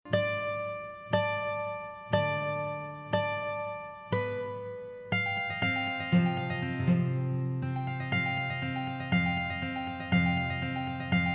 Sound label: Guitar